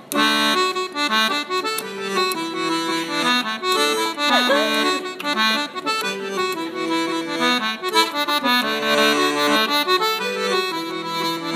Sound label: Accordion